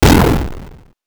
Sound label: randomize, 8 bits; Game Sound Solutions - 8 bits Elements